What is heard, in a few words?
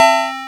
chord